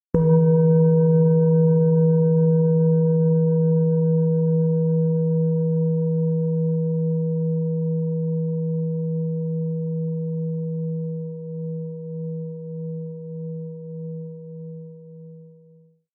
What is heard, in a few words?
Tibetan Singing Bowl 320 Hz Deep Resonance; Solar Plexus Chakra